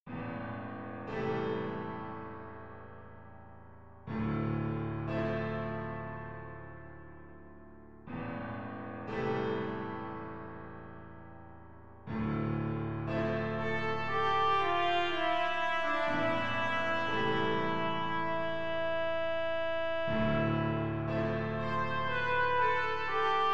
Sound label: Sonatina Trumpet Piano